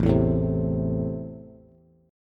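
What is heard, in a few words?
A Minor, Bass, chord strum; Am strummed; JGuitar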